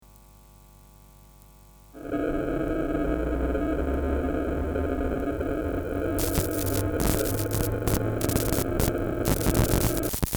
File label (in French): Sons chelous